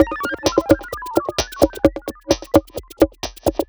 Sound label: Comes With Hats, Underground UK House & Electro, Synths; Sonic Foundry (Sony Creative Software)